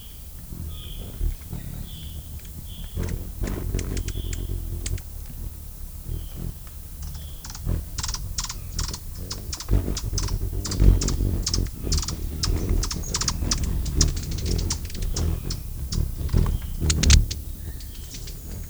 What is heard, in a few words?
hummer